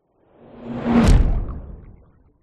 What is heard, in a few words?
fantasy magic spell dark whoosh into watery impact; zapsplat